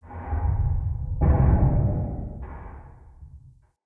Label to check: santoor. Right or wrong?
Wrong